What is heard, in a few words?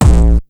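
bass drum distorted room; 300 Drum Machines; Yamaha Motif ES-Series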